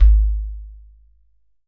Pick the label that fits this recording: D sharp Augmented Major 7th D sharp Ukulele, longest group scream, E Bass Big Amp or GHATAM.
GHATAM